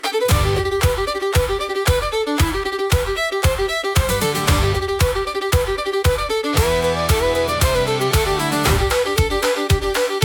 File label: irish pub rock